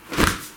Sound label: Block